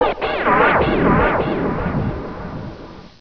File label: WEIRD